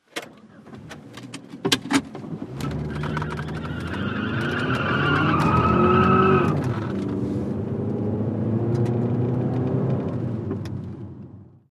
Sedan Mid ST Peel Int

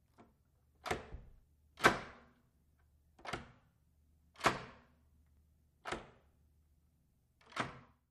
Door Handle Latch

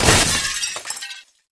Glass Wood

